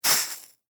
Cigarette Put Out, Foley, Cigarette, Put, Out, In, Water; Smoking Sound Effects; Human